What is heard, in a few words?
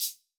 90s hiphop hihat